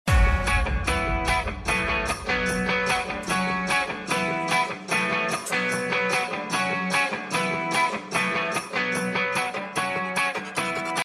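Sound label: electro guitar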